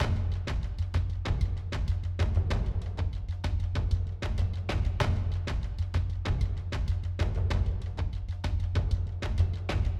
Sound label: music interactive; World of Tanks